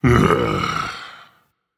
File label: Ugh, Dragon; fafnir